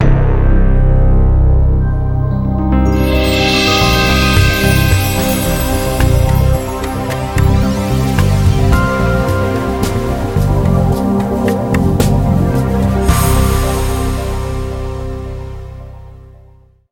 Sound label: Ambient clay